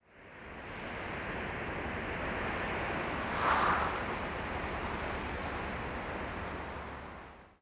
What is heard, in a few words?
wind; Mafie 1